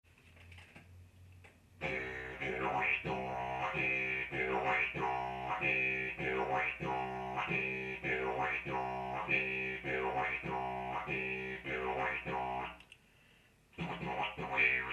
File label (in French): rythme, Rythmer le son avec sa langue, didgeridoo